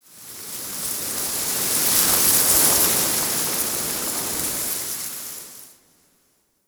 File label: Forest Wind Soft Leaf Gust, Ambience; Warframe; Grineer